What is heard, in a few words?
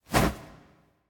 phoenix wing soft; smite; npc